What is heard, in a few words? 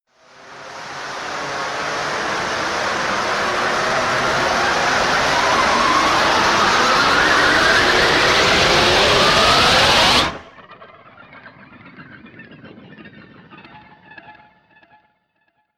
scrape